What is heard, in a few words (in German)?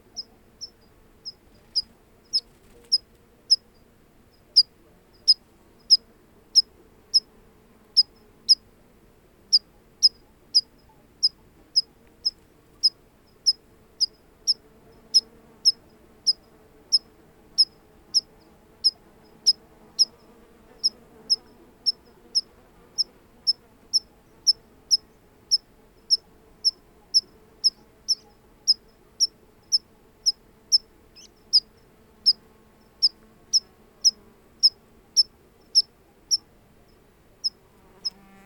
Bergpieper; Rindalphorn OA; Johannes Honold